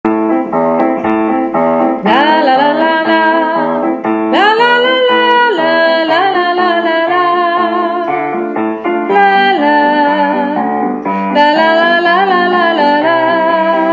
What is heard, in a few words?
Anthem